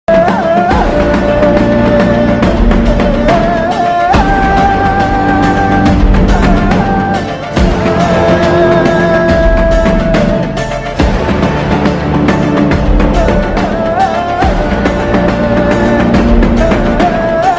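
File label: climax background music ringtone; dasara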